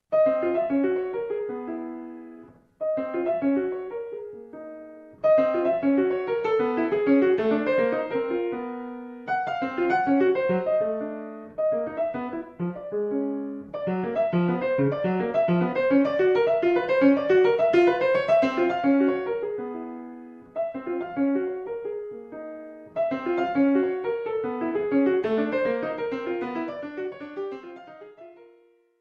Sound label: Piano